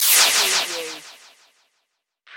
Magical Blast Laser Delay

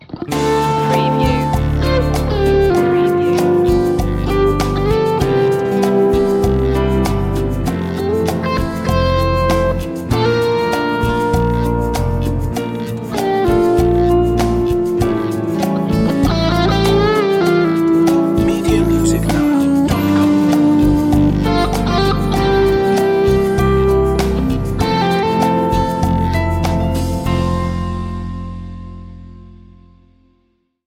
romantic guitar

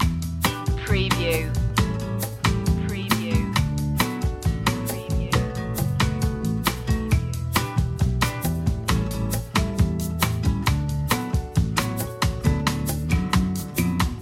summer flower loop

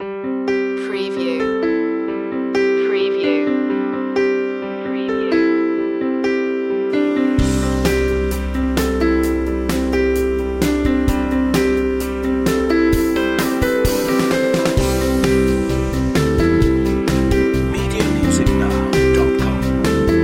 piano

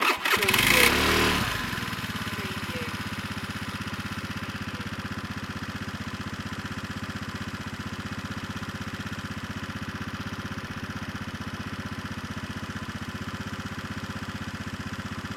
motorbike on idle off

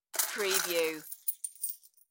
Coins Hand

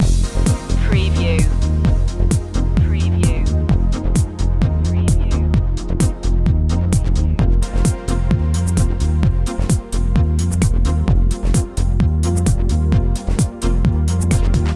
loop

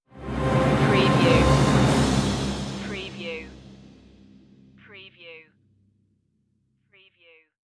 orchestral stinger; the first noel; media music now

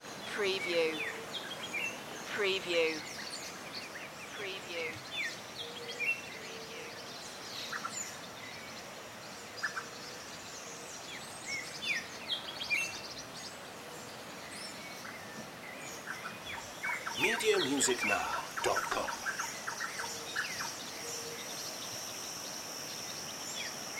landscape with birds

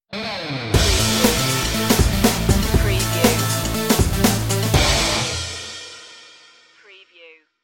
drop that beat stinger

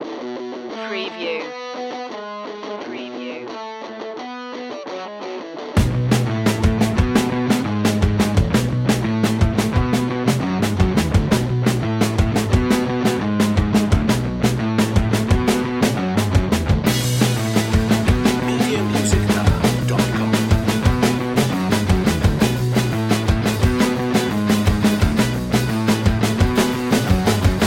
punk pop

